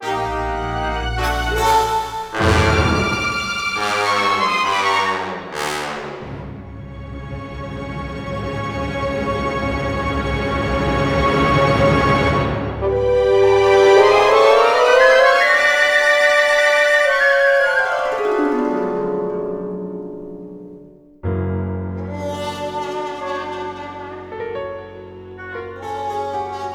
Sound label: Mini Game Zombies Lose; CheeseMines